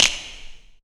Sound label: Click Ambi, Mixed Percussion; Zero-G Jungle Warfare 1, 2 & 3; 90 s Sample CDs